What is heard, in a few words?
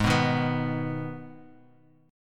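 E flat Suspended 4th Sharp 5th, chord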